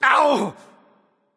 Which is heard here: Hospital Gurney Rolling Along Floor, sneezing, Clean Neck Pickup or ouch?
ouch